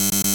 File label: wrong